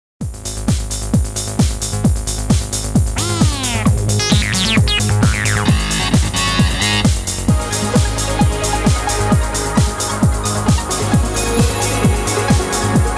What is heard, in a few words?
electro boost